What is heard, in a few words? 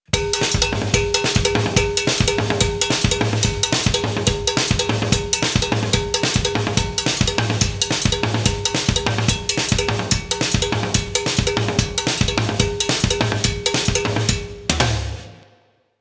Mambo 144BPM